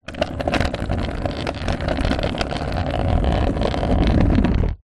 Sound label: sport skateboard ride onboard perspective short